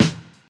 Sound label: Snare Single Hit E Key, steel snare drum tuned to the E note